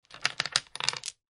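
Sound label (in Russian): фишки бросают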